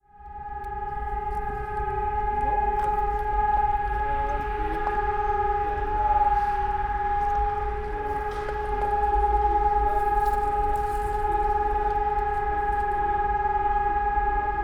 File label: sirens test; prag husitska